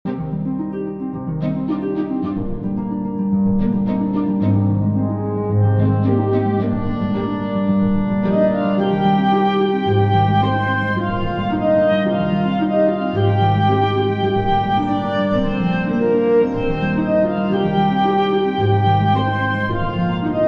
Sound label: world map loop; rewave